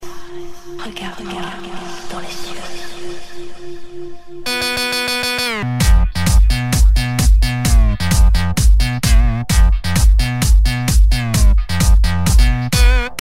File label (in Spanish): electronica ringtone